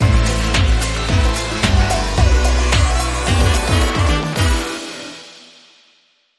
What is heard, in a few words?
Disco